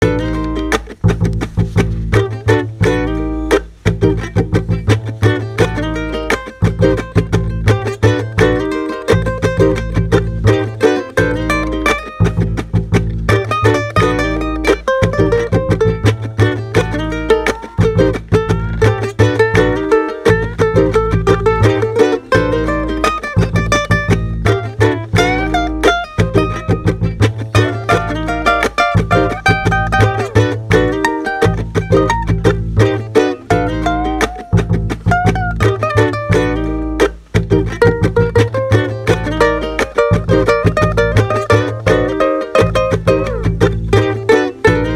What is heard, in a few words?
funky